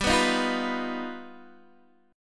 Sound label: G Augmented 7th, Guitar DADGAD